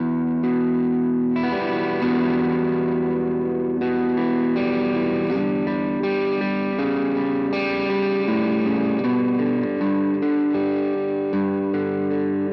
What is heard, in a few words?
MH 1000 Crunch 3 neck; ESP LTD Deluxe